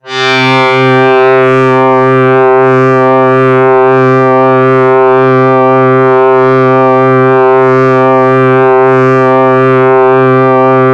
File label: DoubleBass c3, Yamaha YX81Z